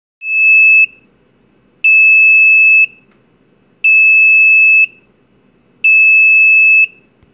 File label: Active Buzzer; MOD-4